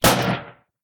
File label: Gunshot